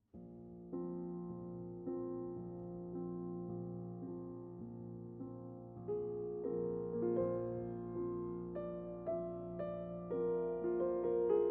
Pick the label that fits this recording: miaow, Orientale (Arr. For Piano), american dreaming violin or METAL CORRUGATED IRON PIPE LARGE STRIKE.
Orientale (Arr. For Piano)